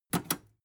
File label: metal button robust click fast